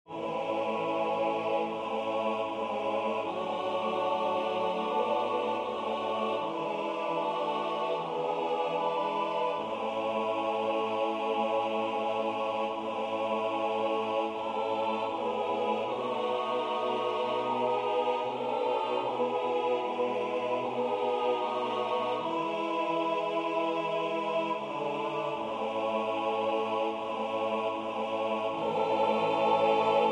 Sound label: SATB; search me o lord; ives